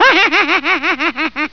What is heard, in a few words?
hahaha